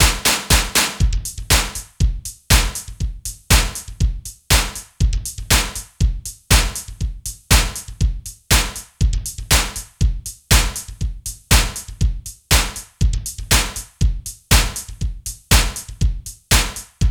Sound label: TECHNO; INIS; Funky Xtreams